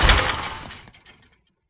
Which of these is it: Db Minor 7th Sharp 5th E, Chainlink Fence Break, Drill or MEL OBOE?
Chainlink Fence Break